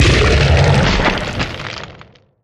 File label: worm death big